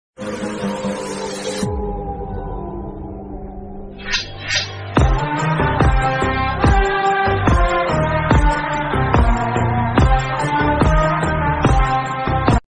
turkish ringtone attitude ringtone bgm

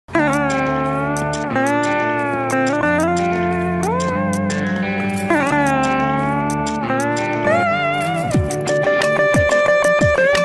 agnyaathavaasi office entry bgm ringtone

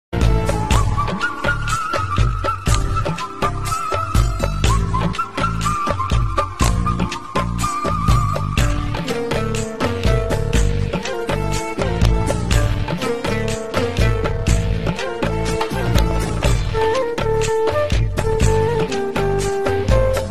flute version instrumental

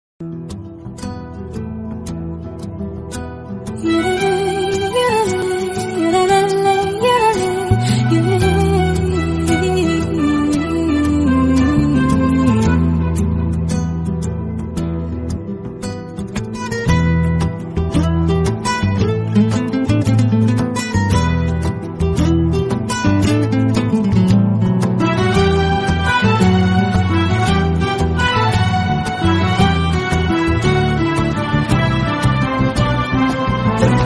movie melody bgm; manam movie

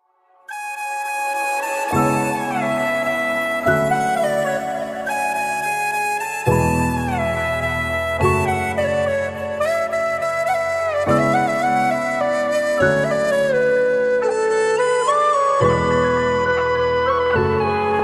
instrumental ringtone; varun dhawan; kriti sanon; bhediya

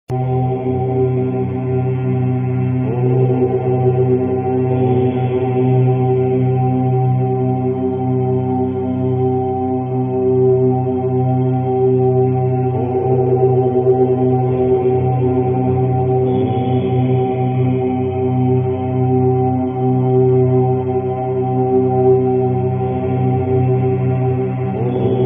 instrumental om mantra ringtone

